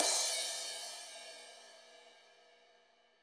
CYM; Bob Clearmountain Drums I

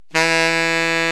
SAX E, SAX BALZY; Best Service ProSamples vol.55 - Retro Sampler; AKAI

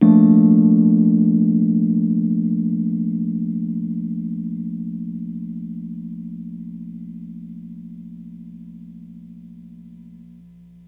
DM FLAT6, Chords Hits; E-MU Producer Series Vol. 8 – Platinum Phatt; EP Chords Hits

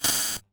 Bent Hat; glitch; Bent Hits

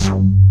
BIG PAN BS; Pro-Rec - Synthsonic; BASSES

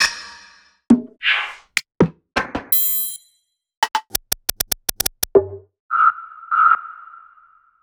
Two Shots Perc

